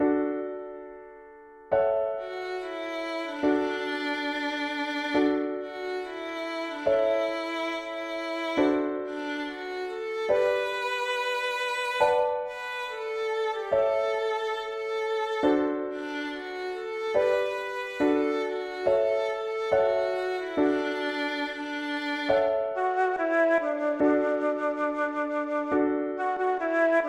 alto vox

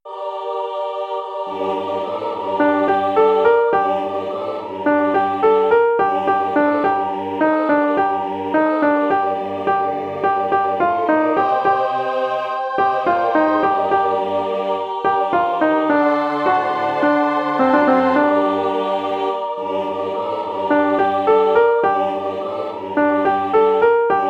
alto verse only; bella ciao

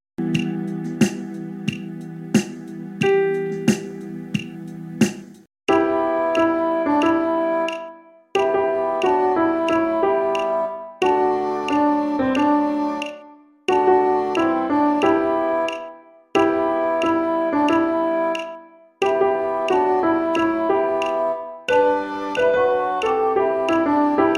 melody inst

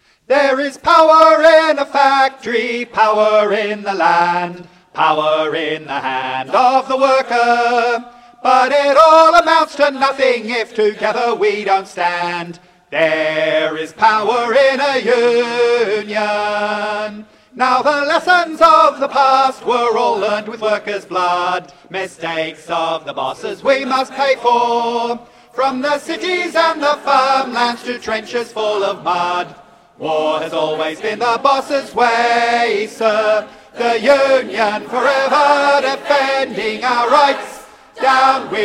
tenor

